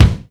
focused kick one shot g sharp key, bass drum sample tuned to the G# note; kick drum samples; one shots